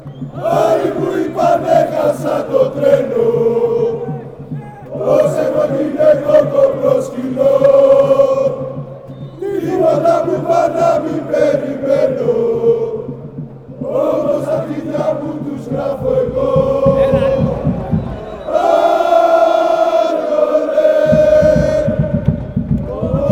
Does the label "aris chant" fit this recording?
Yes